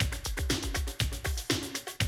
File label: 120, Classic Drum Loops